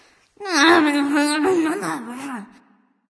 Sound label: grumbling; smite; scylla